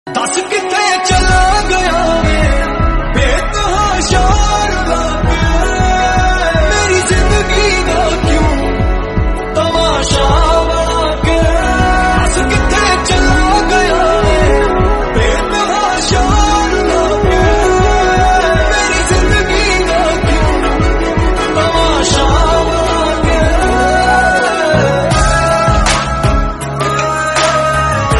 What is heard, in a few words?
punjabi sad song